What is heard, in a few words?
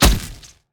whip hit; smite; osiris